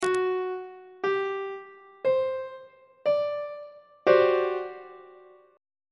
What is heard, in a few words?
f double sharp major 7th suspended 4th 3rd inversion on treble clef